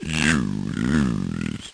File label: lofi Sega sample; Lofi Sega Samples